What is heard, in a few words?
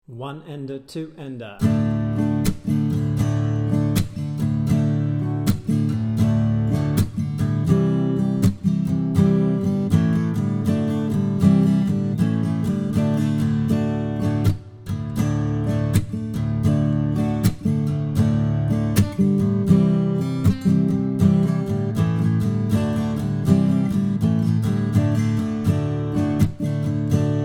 Backing Track 80bpm, banjo; banjo lesson; Banish Misfortune